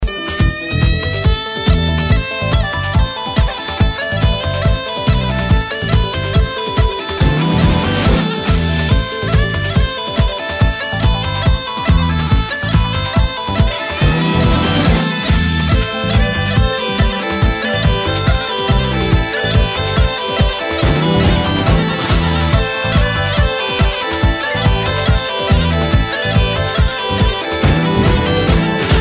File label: Serbian Etno